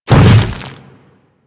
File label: Hammer Boss Step Ice, footsteps; Warframe; Grineer